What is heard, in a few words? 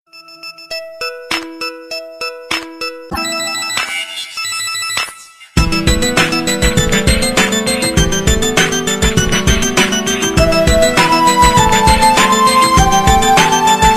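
Sms Remix; Nokia Besst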